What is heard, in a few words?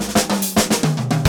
filler 113, drum; BREAKS